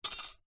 office ruler metal set down concrete; zapsplat

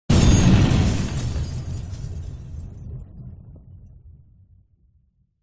explosion large building debris glass; zapsplat

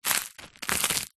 toast cut with knife

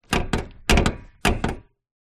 car hyundai trajet hood latch movements open; 2006

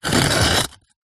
brick scrape over light dirt on concrete; foley

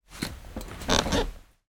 household chair sofa person get up springs

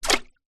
multimedia game designed water object throw in

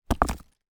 foley rock small down on others

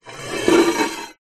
metal scrape rub drag on metal smooth; foley

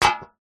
food drink soda can empty set down wood deck; zapsplat

